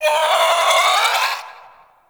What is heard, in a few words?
Venus Landscape Cave Creature Calls, Ambience; Warframe; Venus Open World